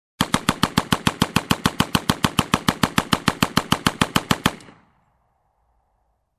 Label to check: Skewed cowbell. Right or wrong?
Wrong